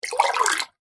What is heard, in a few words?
water submerge glass; Drinking Glass Being Submerged In Water; Materials; McKinney Sound